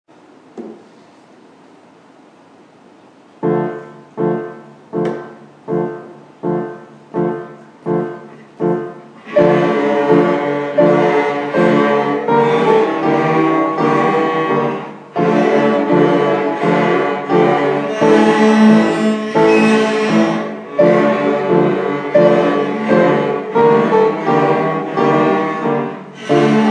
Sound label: cellos; orchestra